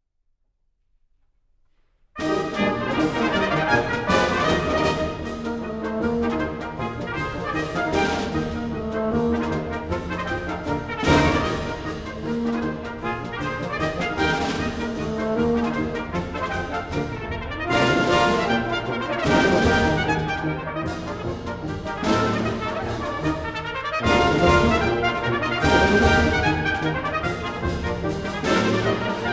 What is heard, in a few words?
Civil War Brass Band; Journal 4